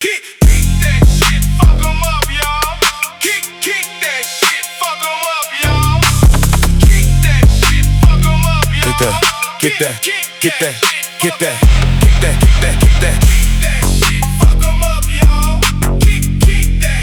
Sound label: phonk